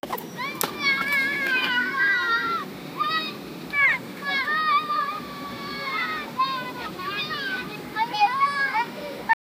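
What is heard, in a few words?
children skiing cold weather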